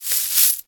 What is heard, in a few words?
Coins